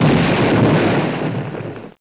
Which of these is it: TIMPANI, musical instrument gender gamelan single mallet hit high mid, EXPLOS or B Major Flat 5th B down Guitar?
EXPLOS